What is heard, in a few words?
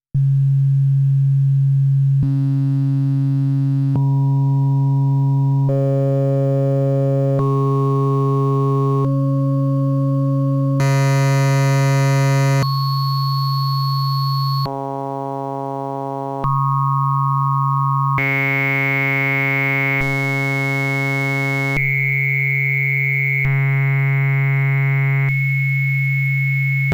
Studio Electronics Quadnic Waveforms Bank